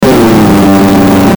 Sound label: Descend Electrical Buzz; Descending Sound Effects; Blastwave